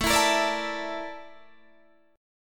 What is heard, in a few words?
Bb Major 7th Flat 5th A